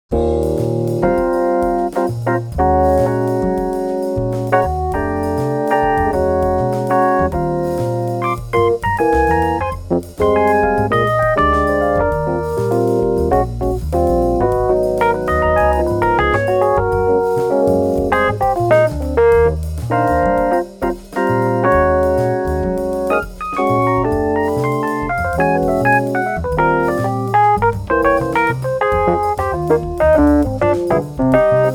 EPiano

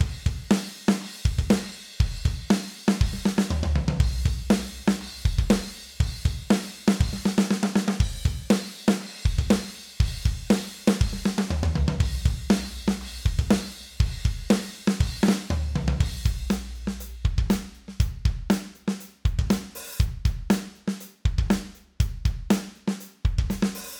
before Drums

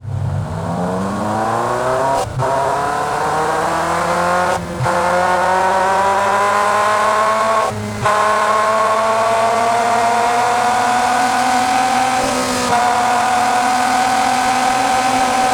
Ferrari 348

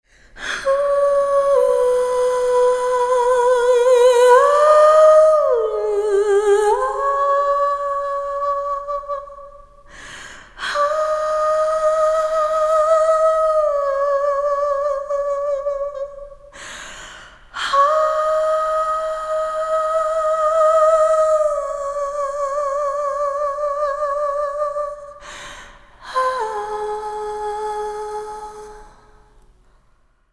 little plate Two Second Warmup; soundtoys

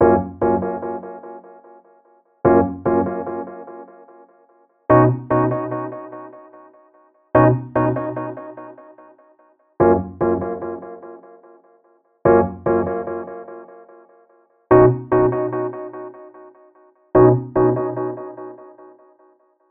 delay echoes triplet wet; aas; objeq delay

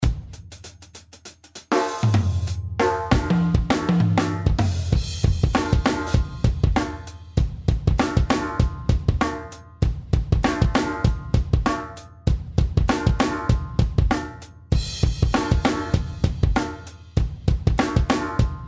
EDM 98 bpm

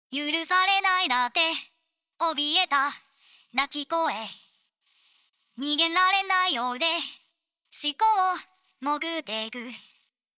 Vocal 1073LBEQ Before; その他素材(Vocal)試聴; 元の素材; Neve 1073LBEQ

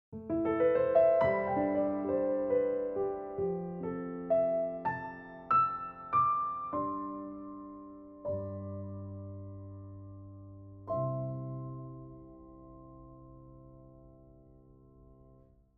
piano session

